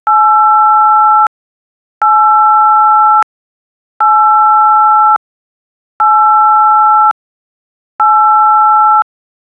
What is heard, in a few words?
the sound of a few, keypad